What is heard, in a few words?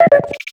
cuckoo, notifications; Nothing Phone (2)